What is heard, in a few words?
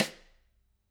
K2 room SdSt, drum